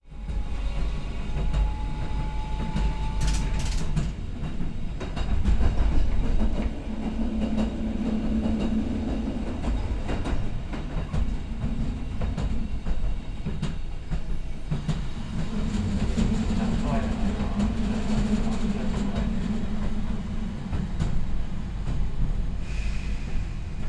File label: Train, Interior, Tram; TRNInt; City Sounds; M8